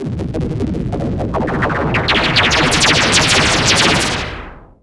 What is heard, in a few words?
FX SWEEP